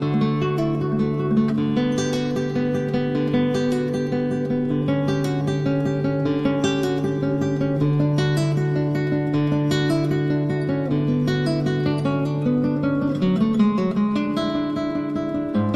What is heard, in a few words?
Cello Suite No. 1- Prelude